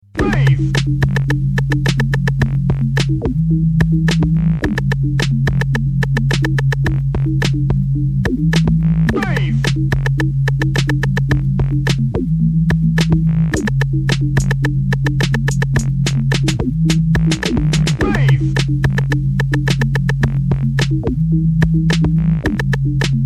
beat sketch

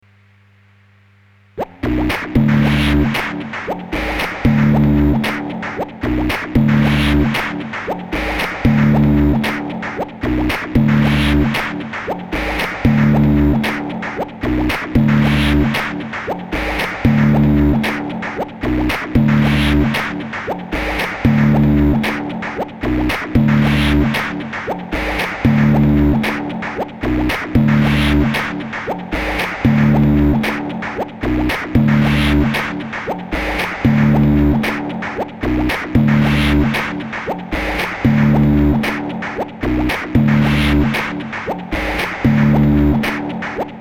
sp202 beat

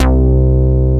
Bass, Akai MPC-60

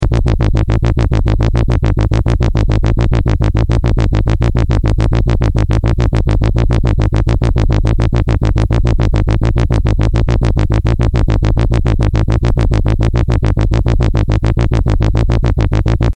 synth

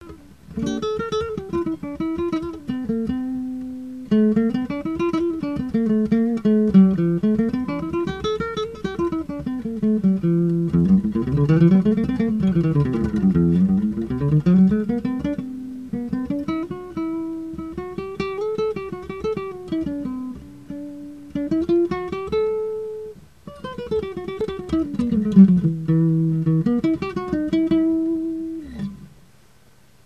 solo; Solo without a sense but that makes me happy (if children are not around); Sounds from a dark[o's] guitar

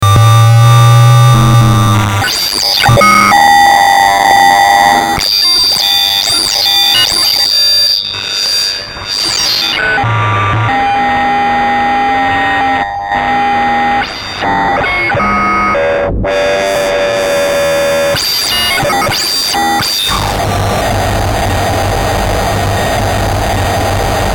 noise

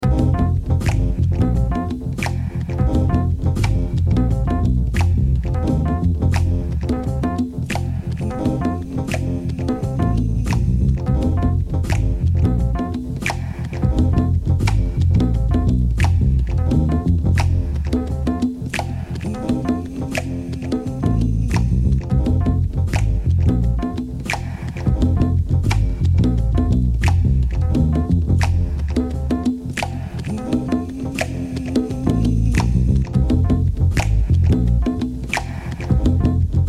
beat

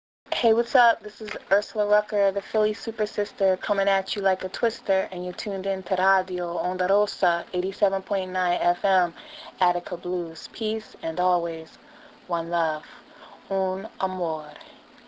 jingle

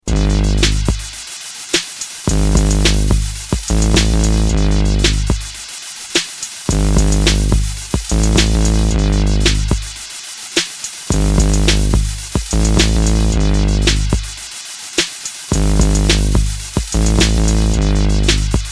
beat microkorg

